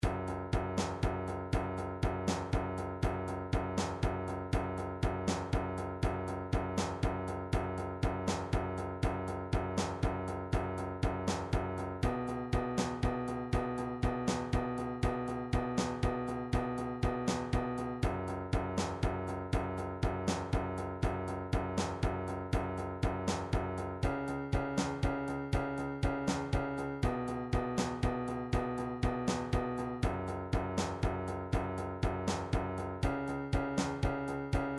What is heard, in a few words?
drums blues